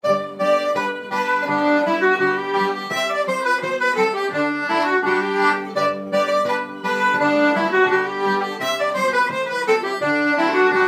engelska from skane

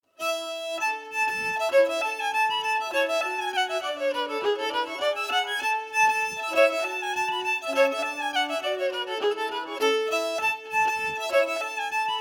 Reel melody emphasized

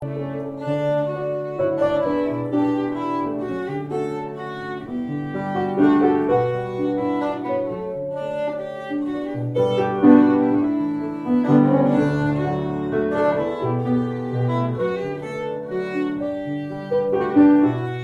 Waltz

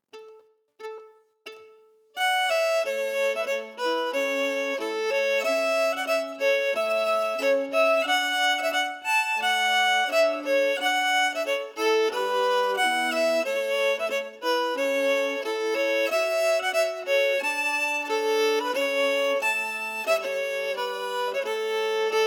melody emphasized

